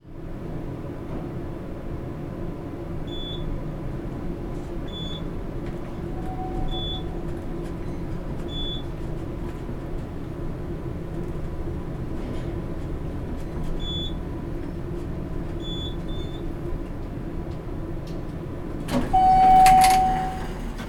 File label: Elevator Ambience